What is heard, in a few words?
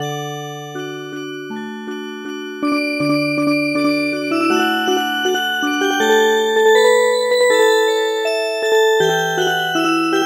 Music Box